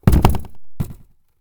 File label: swingdoor open; baldis basics